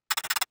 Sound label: drone chirp